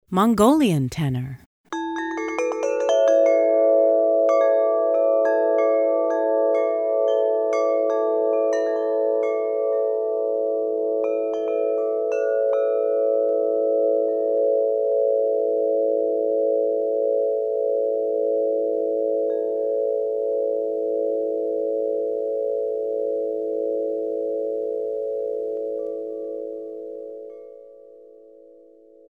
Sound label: Mongolian Tenor